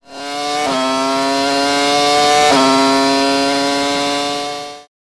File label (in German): panorama motorrad; abmischen tutorial